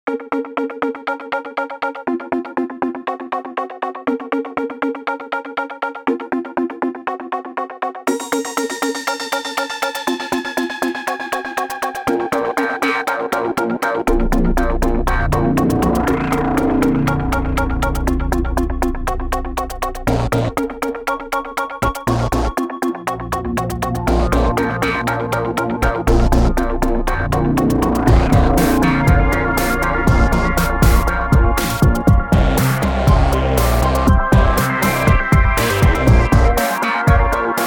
Shiver; ImpOscar2; gforce software

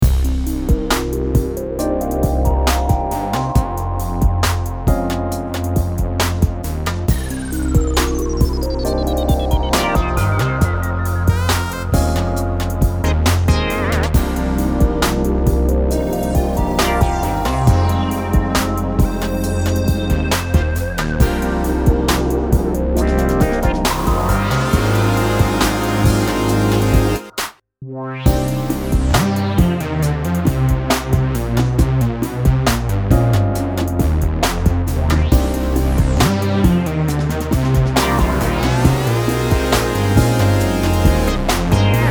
Slo Funk

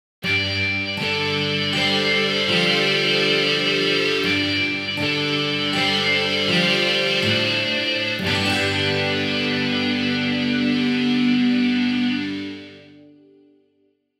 Tron Guitars

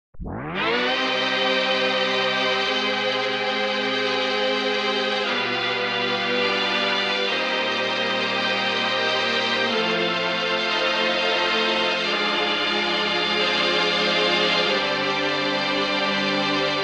Stringeth Brasseth

